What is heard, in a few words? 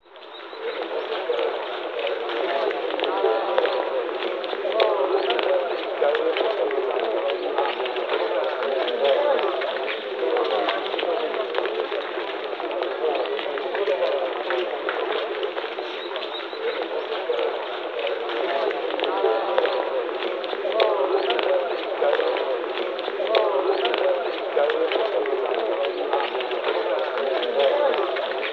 Ambient BGM